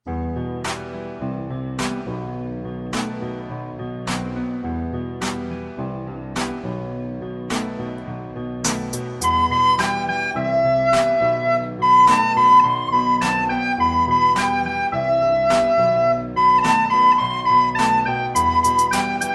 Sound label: background track with recorder